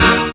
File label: syn d#, Dance Synths Stabs & Hooks; vol.37 - Dance Synths